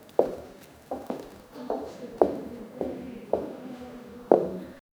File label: lady slow walking with